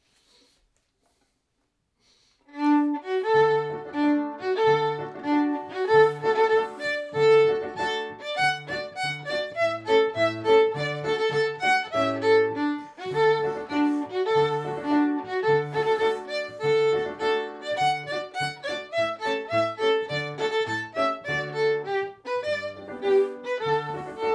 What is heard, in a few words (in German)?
Militaertrompete